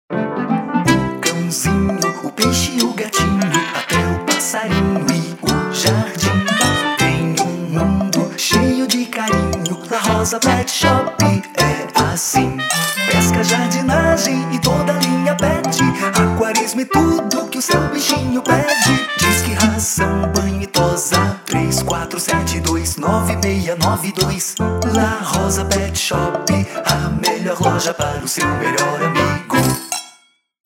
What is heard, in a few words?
jingle